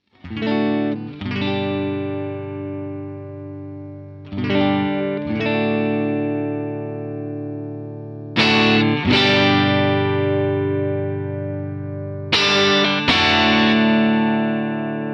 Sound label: Nighthawk Samples Rhythm Strat Sound